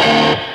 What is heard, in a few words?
death, Guitar; Notes